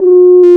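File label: french horn, brass; Roland - LA Composer vol. 1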